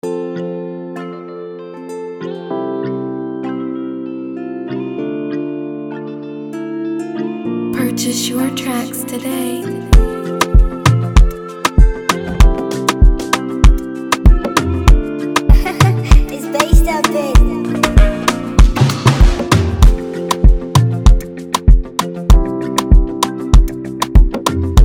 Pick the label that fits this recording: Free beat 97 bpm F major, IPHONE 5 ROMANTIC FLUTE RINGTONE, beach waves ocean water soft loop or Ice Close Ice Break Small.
Free beat 97 bpm F major